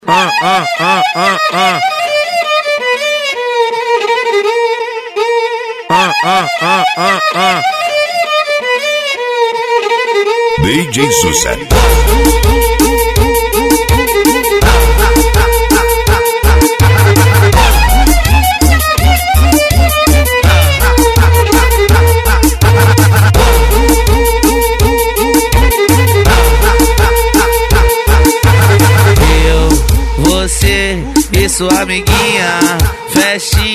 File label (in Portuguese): sound car especial mix